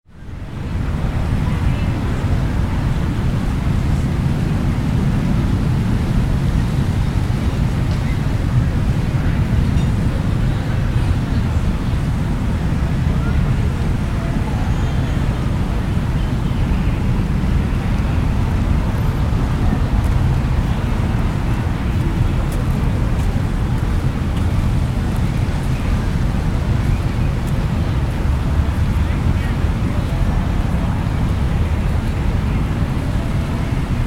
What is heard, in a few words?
City Street